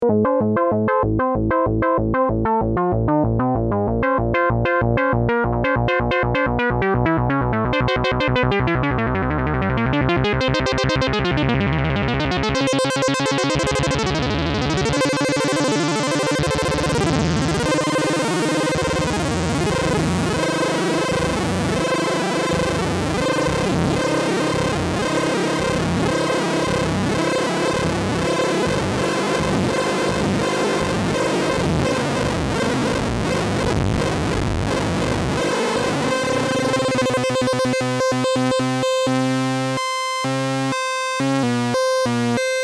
Arpi Pad to Mini Taur

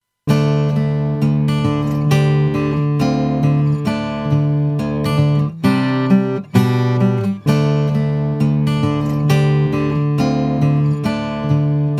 Guitar Original; Maeag EQ4M

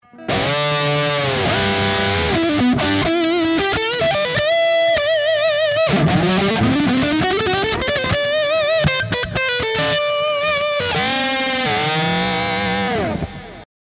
Framus Diablo Pro Bridge Humbucker Highgain Lead

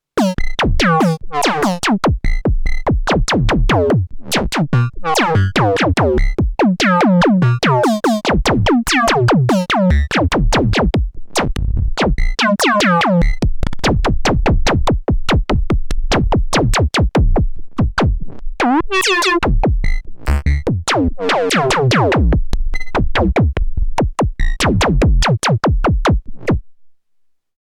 Erica Synths Perkons HD 01 Voice

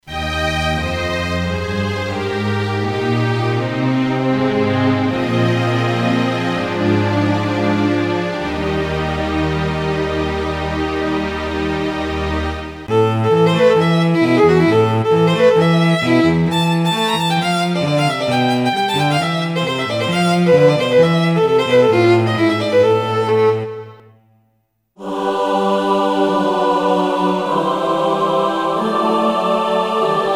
Strings Choir

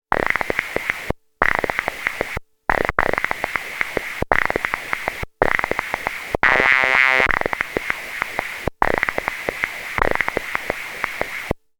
MS-20 Frosch FX; Lectro

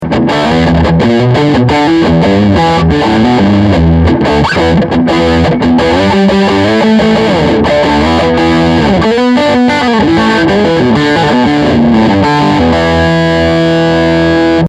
full gain boost

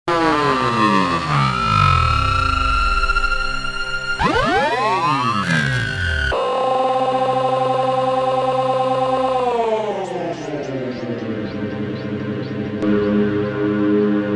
Sequential Prophet X Glitch Choir

